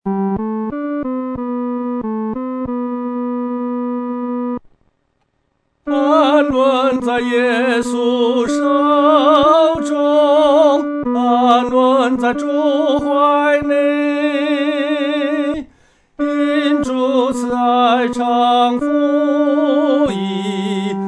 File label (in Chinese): 独唱 第三声; 安居主怀